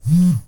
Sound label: Vibrate